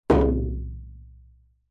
musical drum tom plastic hit single strike

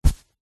footstep single walking on thin fabric; zapsplat

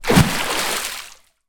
nature water movement splash

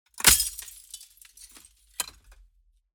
Impacts SIMPLE; soundbits

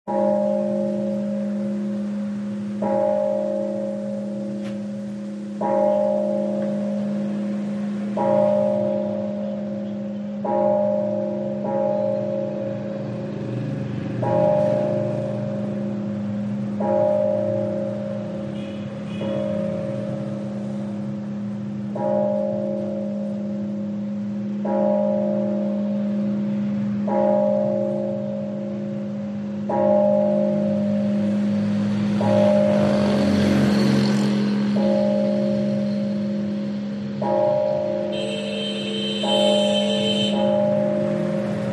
bell ring Caodaism Church traffic vietnam

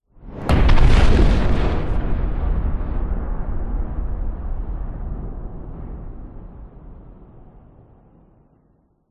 explosion designed large heavy mushroom cloud; zapsplat

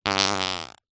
cartoon fart

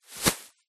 foley plastic bag very thin item drop in; zapsplat

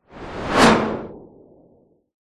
whoosh white noise simple very fast; zapsplat

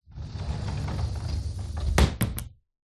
household cupboard door roll slide close

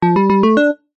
multimedia game sound retro generic tone

